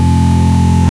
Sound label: clean high rev engine, land cruiser